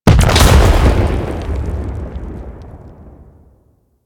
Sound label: Arachnoid Camper Death Explosion; Warframe; Corpus